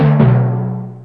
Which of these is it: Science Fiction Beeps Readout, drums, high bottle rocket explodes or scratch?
drums